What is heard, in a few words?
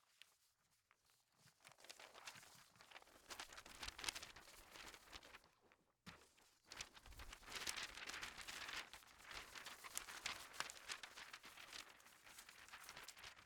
Paper Loose